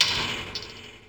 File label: BREATH